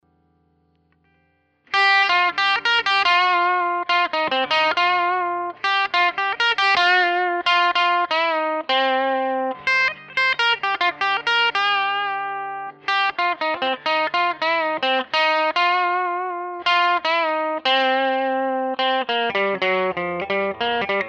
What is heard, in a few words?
bridge Slow Blues; Bridge Position Pickup